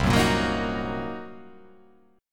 C Minor 7th Flat 5th, chord

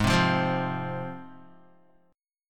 G sharp 6th G sharp down Guitar, chord; strummed; JGuitar

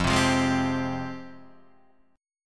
F Minor Add 9th F; strummed; guitar; JGuitar

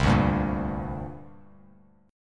C 7th Suspended 2nd, C, down, Bass Standard; C7sus2 strummed; JGuitar

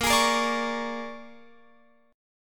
A sharp 7th Sharp 9th, Guitar, Open F; A#7#9; strummed; x,17,20,17,17,20

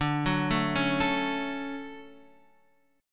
D-Major 7th D down Guitar Half Step Down

